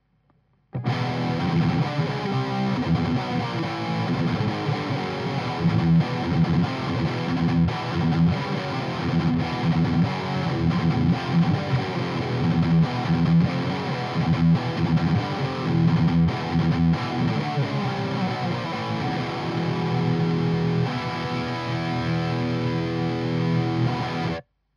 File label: Rhythm SM57; EHX 5150